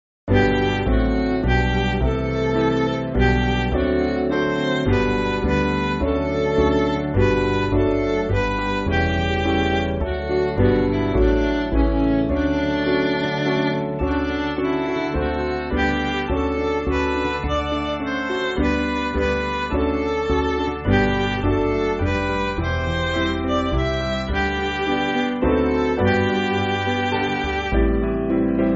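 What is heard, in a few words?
Midi, Backing Files for Churches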